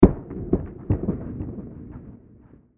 distant explosion

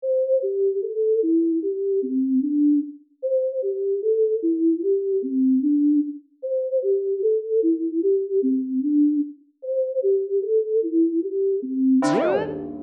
broken tune

